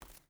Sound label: footstep barefoot concrete walk; Rust